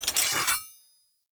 Two Handed Axe Equip; Weapons; Enemy; Axes; Sinecure Two Handed Axe

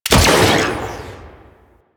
Freeze Ray Shot; Warframe; Venus; Venus Shockwave Spaceman Agent

